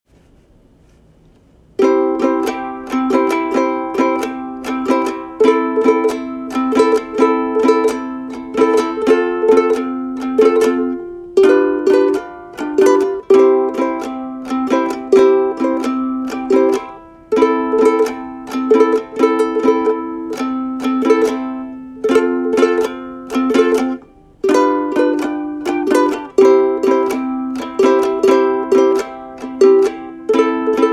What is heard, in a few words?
Ukulele